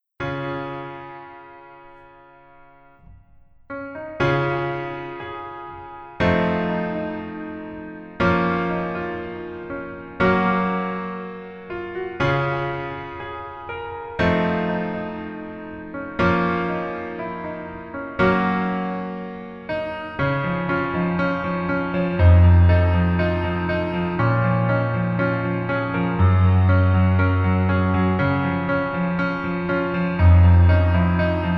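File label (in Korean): Piano version 멜로디 MR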